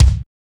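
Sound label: headbanger kick; NATUR. K1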